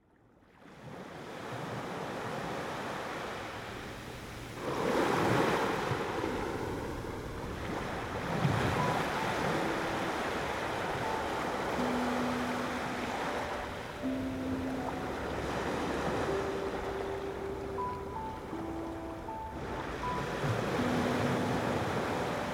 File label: Ocean Relax